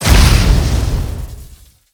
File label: Lightning Storm Hit; MUDevs S20.1-3